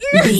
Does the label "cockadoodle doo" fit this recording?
No